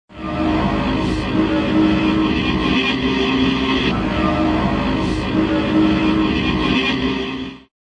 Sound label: super spooky panning ship, Atmospherics